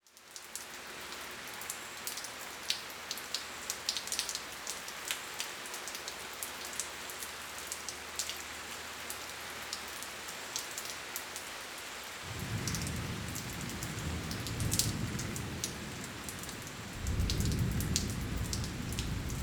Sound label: Weather Ambience After Rain Drizzle Splatty Thunder Insects